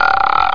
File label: Chair; Explorapedia; KidsRoom